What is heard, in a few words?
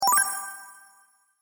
Magic Idea; Magic Sound Effects